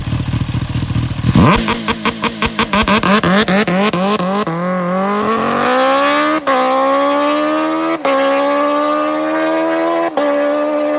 heavy bike sound kawasaki ninja h2r exhaust

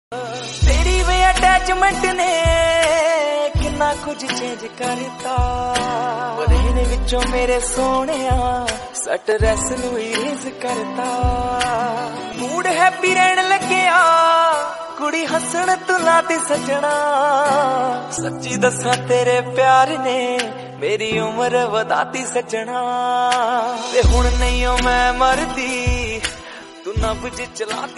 punjabi

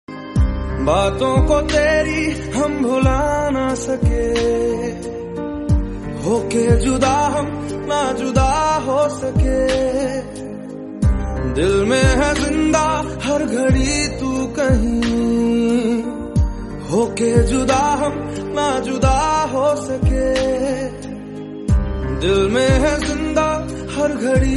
sad song hindi

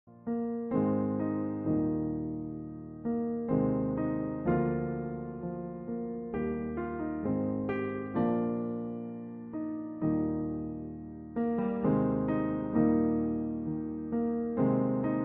instrumental song

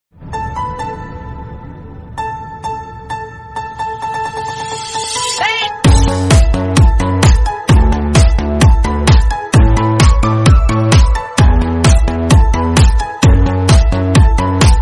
deep house remix

